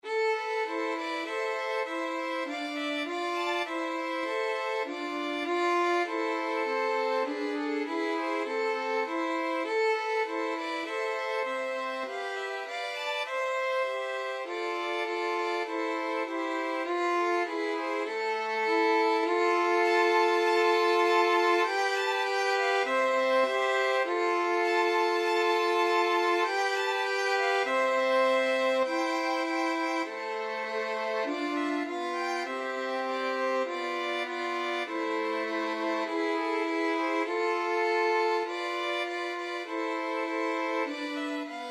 national anthem violin trio; israel

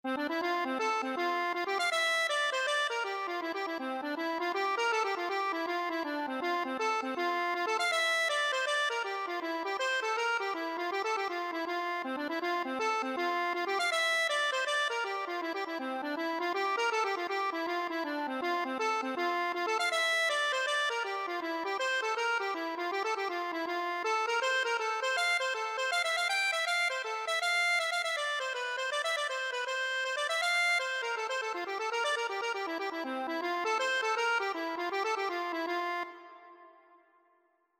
hornpipe, accordion; dunphys

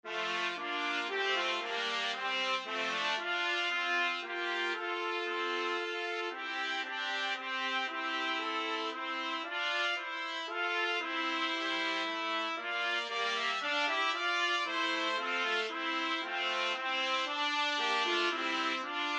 trumpet trio

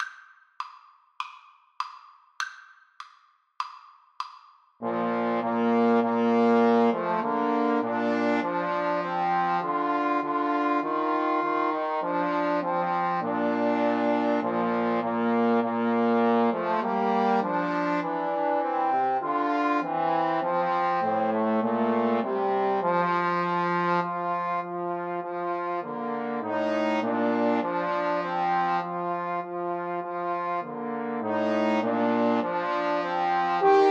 trombone trio

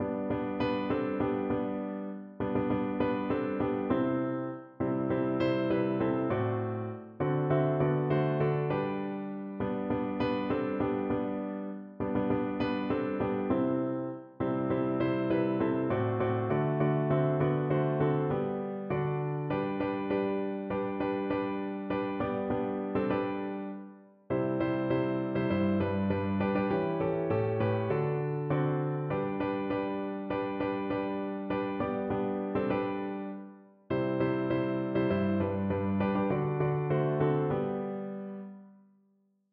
jingle bells, piano

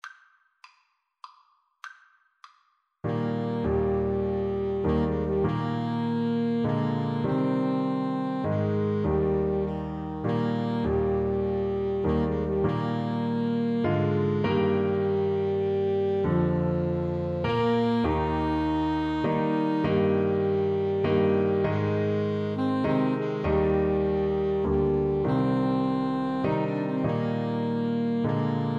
amazing grace 2 A SAX

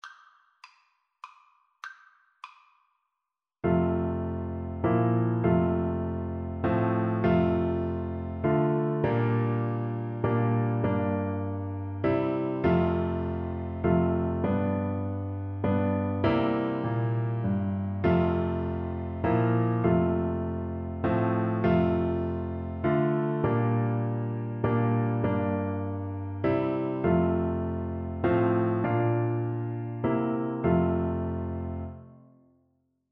bassoon

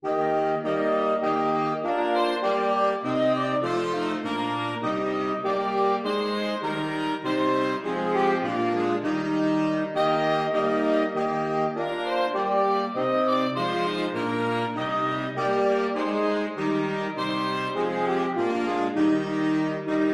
saxophone quartet; ein feste burg

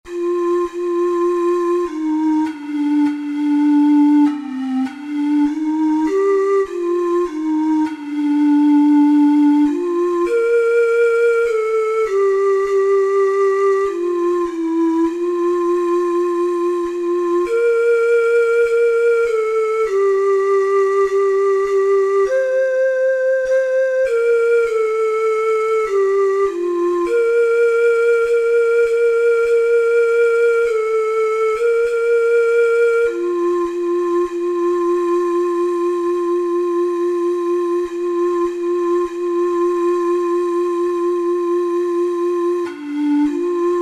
it is well, ocarina four hole

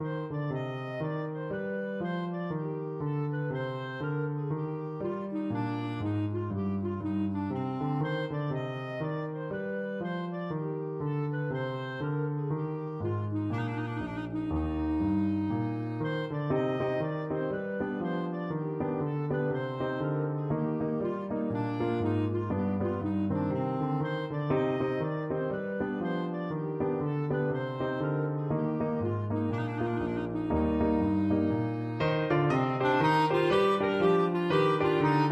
bouree clarinet; bach

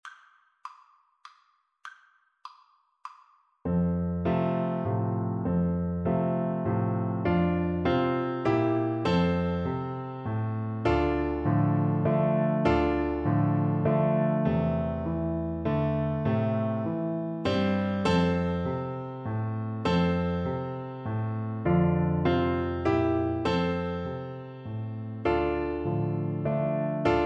waltz, Trombone

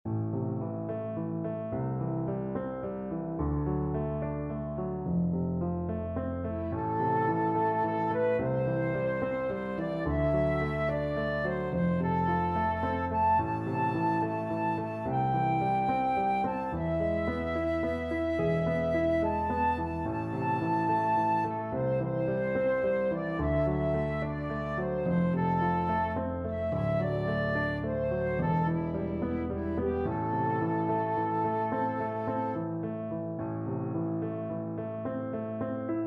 House of the rising sun flute

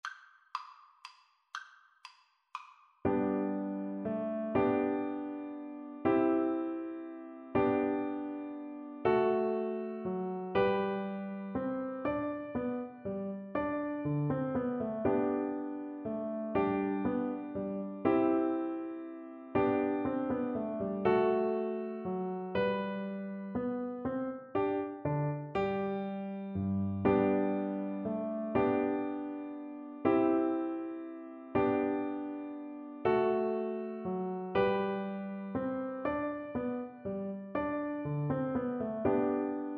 bach minuet FL kar, Flute

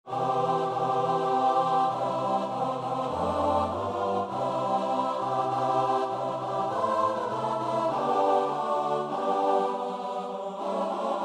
choir; lord bless you

